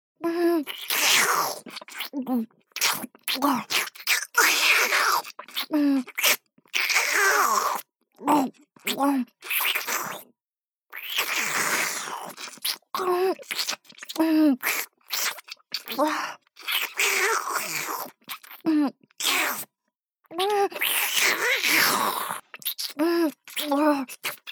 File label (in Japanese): バキューム フェラチオ ループ